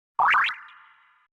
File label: notification sound; persona 5